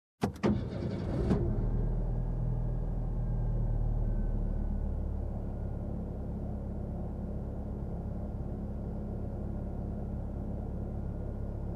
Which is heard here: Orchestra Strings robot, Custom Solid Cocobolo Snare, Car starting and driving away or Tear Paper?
Car starting and driving away